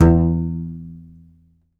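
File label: contrabass balalaika, Plucked Strings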